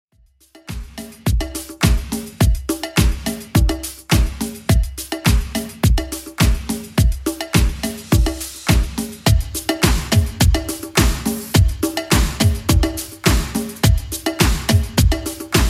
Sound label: 80s Redrum 105bpm